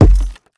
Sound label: Footsteps Wood Run; The Town